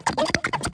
creature emote

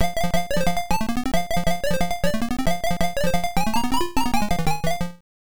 Coffee Break Music; pac man msx

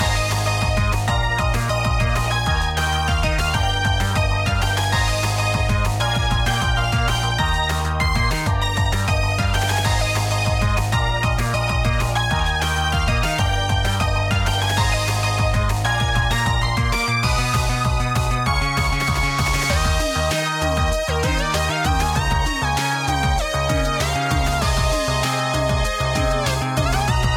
Match Loop; basketball club story

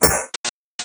Glow Bot Hurt; A Robot Named Fight

